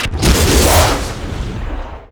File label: lrm fire